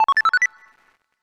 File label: MAIL; SMAPH MAIL